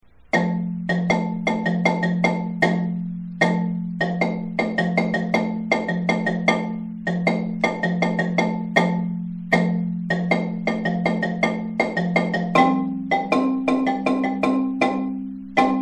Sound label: layering, marimba